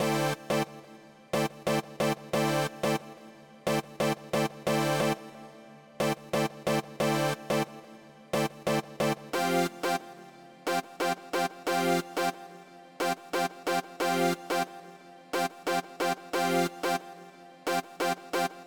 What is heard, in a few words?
Poly Stacks; super crush k.o.